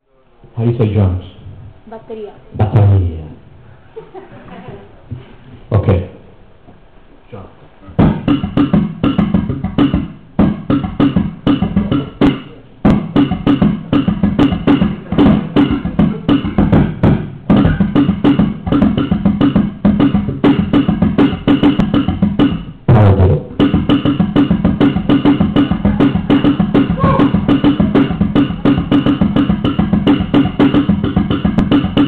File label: Ghost Notes, bass; Miller dà una dimostrazione pratica usando le ghost notes; Marcus Miller alla Casa del Jazz a Roma (2006)